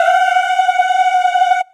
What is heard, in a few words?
screaming orgasm; Notes